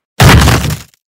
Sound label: crate stash; ROOTBOX